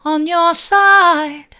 mid, vocal

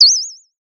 Sound of a bird tweeting nicely

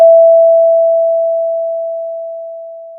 pop, Sound of a school bell

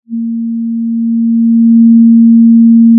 pop, Sound of a cruise ship horn

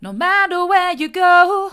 mid, vocal

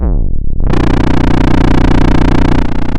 Sound of a chainsaw

